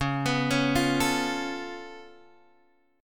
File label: C sharp Augmented 7th C sharp down Guitar Open G, chord